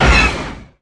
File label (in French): Faucon laser; vaisseaux